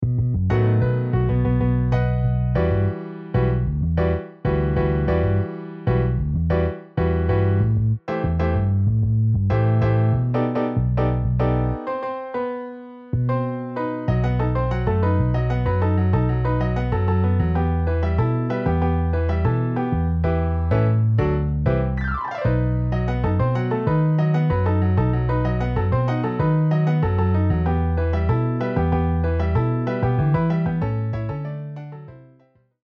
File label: Piano Bass MIDI